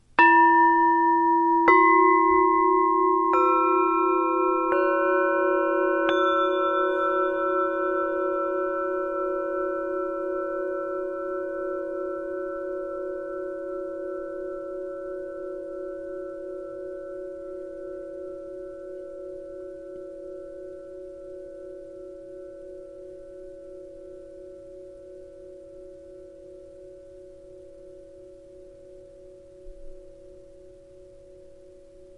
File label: All Bowls